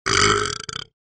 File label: burp; assassin m4a1